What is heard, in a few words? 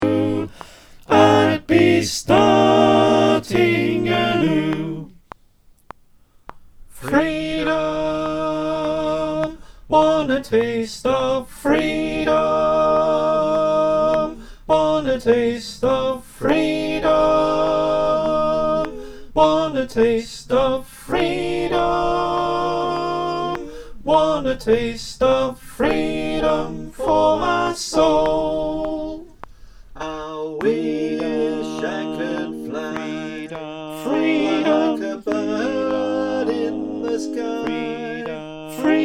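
alto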